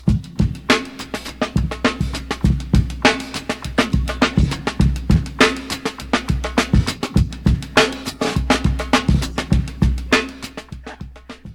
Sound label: pattern